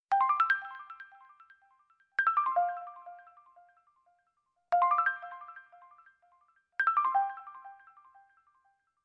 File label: Windows 8 Tone